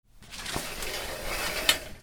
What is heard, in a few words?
BATHROOM